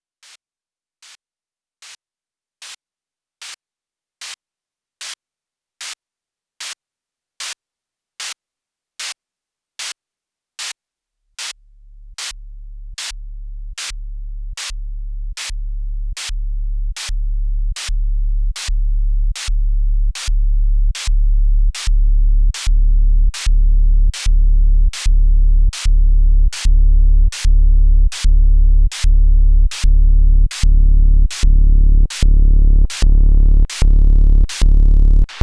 Behringer System 100 Simple Gate Rhythm

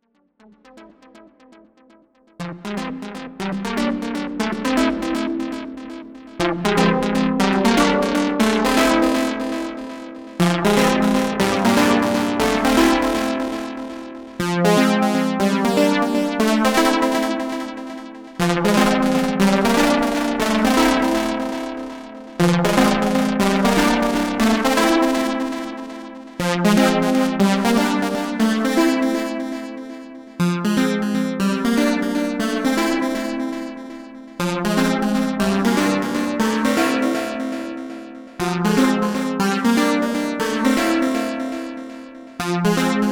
Novation Peak

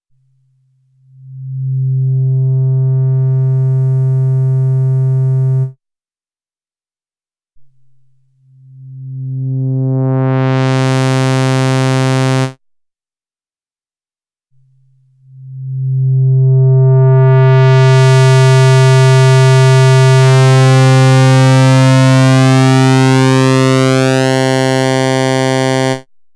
Behringer System 100 VCO VCF

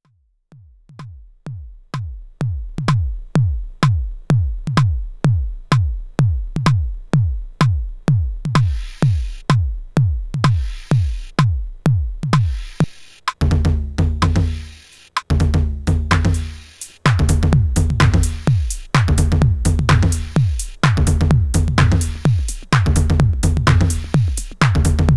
uno drum

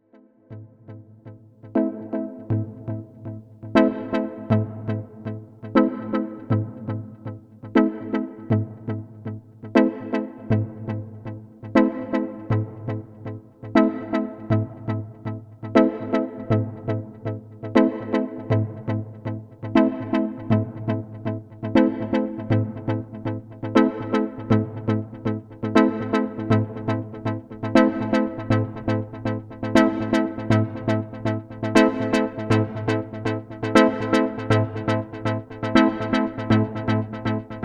Novation Peak Chord

